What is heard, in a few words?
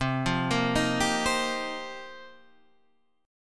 F 9th Suspended 4th C, chord